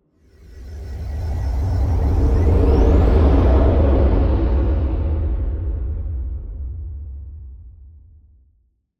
Space Craft Whoosh, Digital, Sweeper, Airy, Slow, Rumble; Scifi, Spaceship; Science, Fiction; Spacecraft Sound Effects; SoundEffects-BlastWave